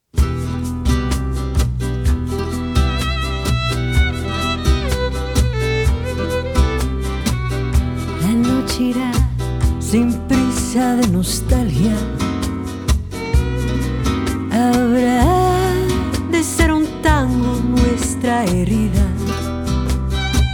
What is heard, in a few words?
Cafe Latino